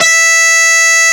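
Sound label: Distorted Guitar E1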